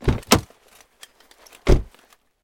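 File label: sound of opening car front passenger door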